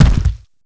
body fall

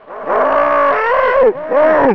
pain; custom monsters; necroscasm

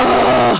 uhh!

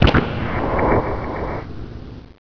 door move

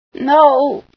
pain, female sci

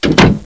close door

